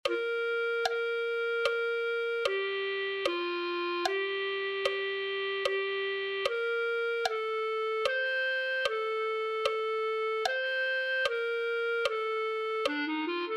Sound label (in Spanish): y mi ser tiene paz clarinete